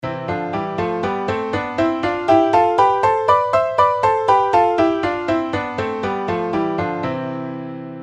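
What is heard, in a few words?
double thirds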